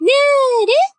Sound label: combo break; osu